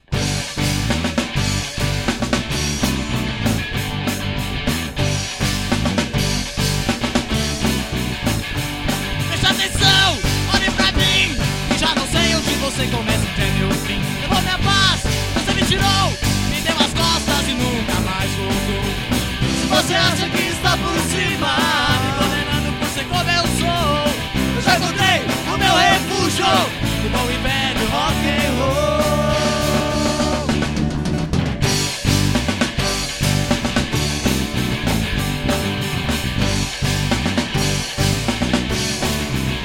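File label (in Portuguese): velho rock and roll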